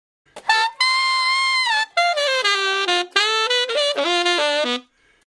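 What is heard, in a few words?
Tenor Saxophone Solo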